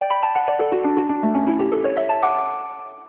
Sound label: shutdown; Just5; J509; Startup and Shutdown